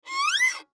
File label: Violin Squeak; Cartoon; Cartoon Music; Cartoons; Blastwave